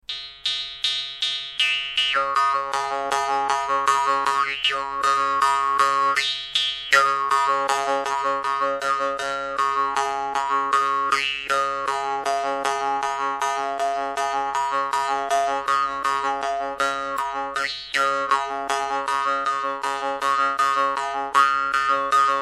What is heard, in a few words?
India brass, morsing brass; wirok